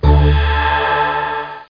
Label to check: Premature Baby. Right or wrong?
Wrong